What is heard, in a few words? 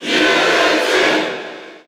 Cheer Japanese; Mewtwo; SSB4; SSBU